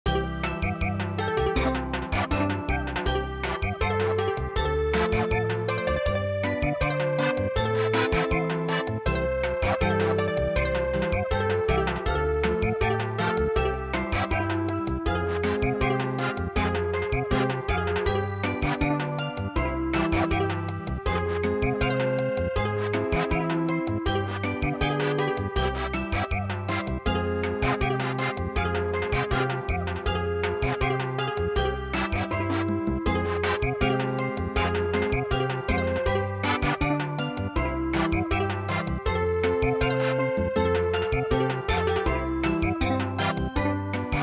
Samba

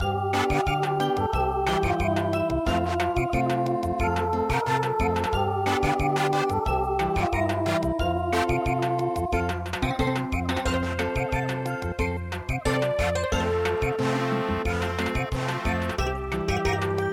Samba, midi; HOE HOE NA WA A

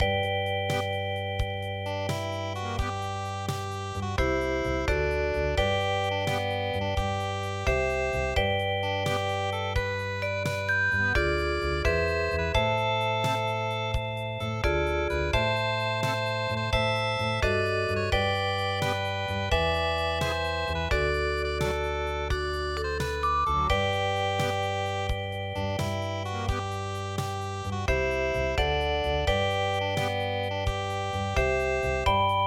Paris Musette; Capitano Il

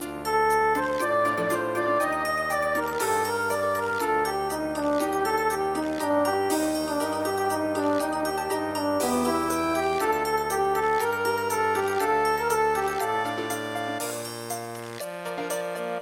nursery rhyme; ichijou modoribasi

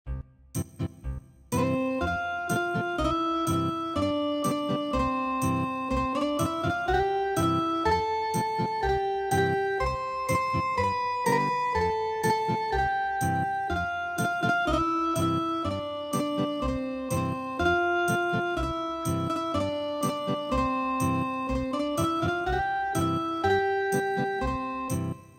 Ethnic Guitar, traditional

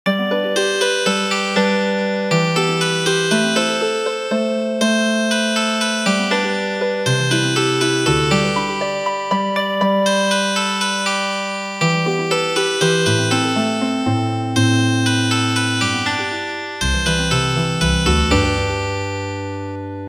Healing Harp

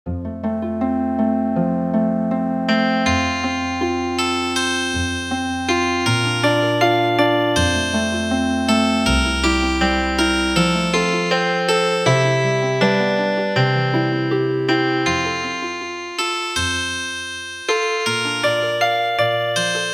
Healin Harp

